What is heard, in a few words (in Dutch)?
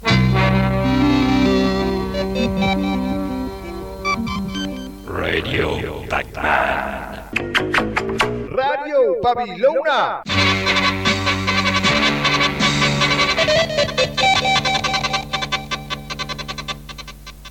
jingle; Pacman en Babylona